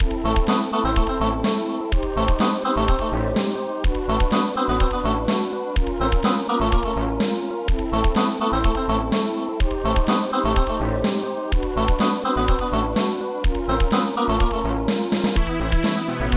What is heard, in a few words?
level music dungeon